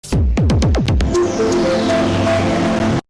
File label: deep fried music